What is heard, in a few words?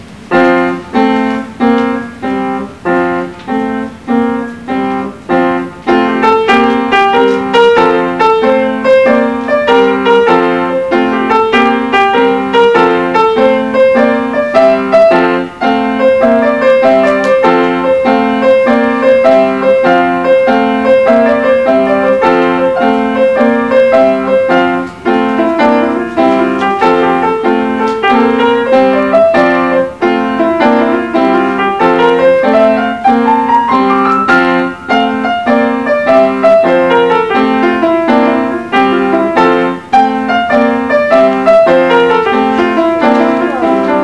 Italian Pipers